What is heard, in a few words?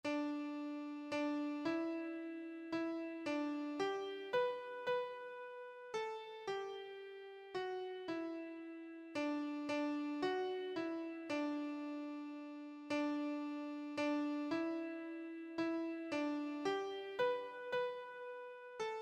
blaenwern, keyboard